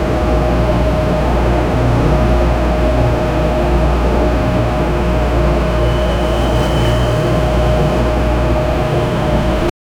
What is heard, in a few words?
factory